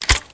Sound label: 12 Gauge Shotgun Unload Shells; Weapons